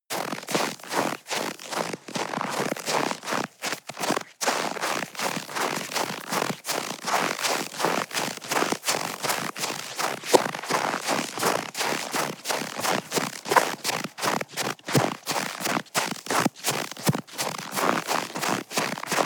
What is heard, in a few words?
step snow walk fast